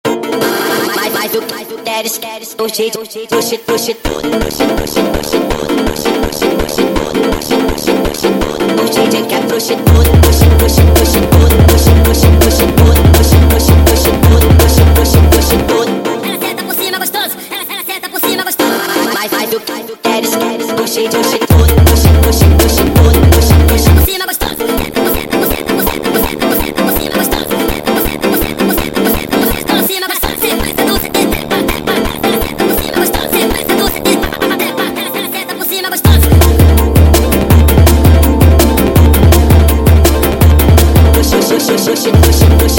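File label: sped Up, phonk music; funk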